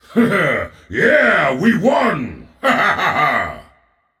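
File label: non verbal yay, voice; cernunnos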